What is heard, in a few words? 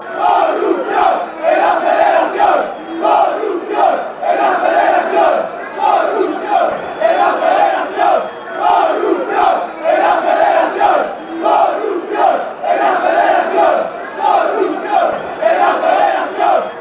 corruption, chants; Real Zaragoza; Founded on 18 March 1932 in Zaragoza in Aragon